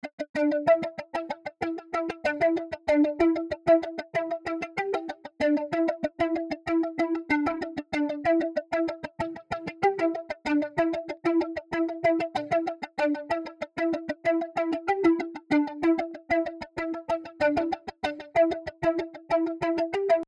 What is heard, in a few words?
Guitars Section; West Coast